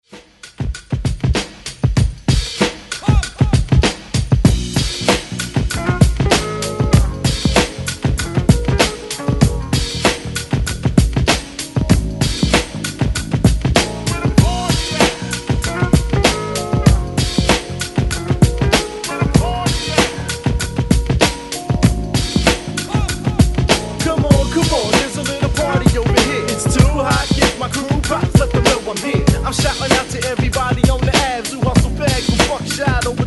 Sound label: 90s 96Bpm